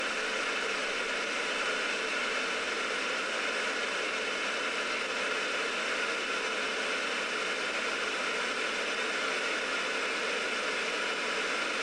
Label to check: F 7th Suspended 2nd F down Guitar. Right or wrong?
Wrong